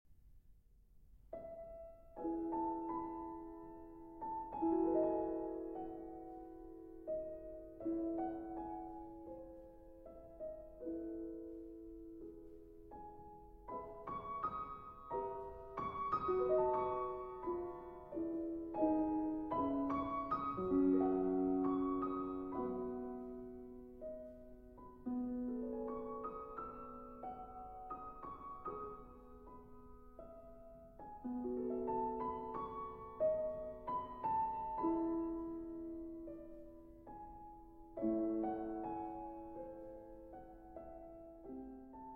Violin Sonata in C Minor, Allegretto espressivo alla Romanza; Grieg; No. 3; Op. 45 II.